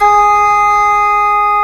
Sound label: CORNET G#4; AKAI S-Series CD-ROM Sound Library VOL-2